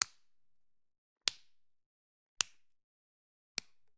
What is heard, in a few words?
Generic Button Wood Tiny; boom library; The Interface